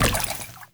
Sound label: Walk Water, Footsteps; Characters; Enemy; Lady Deora